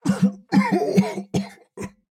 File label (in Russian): Кашель; Турбосвин